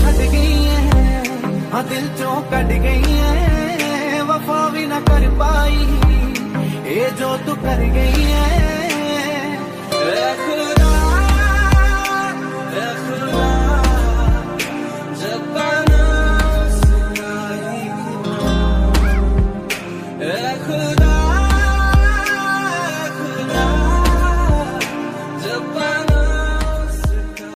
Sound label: love music bollywood ringtone